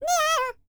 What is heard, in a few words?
BLACK SEA, World Voices; ILIO; Vocal Planet VOL-5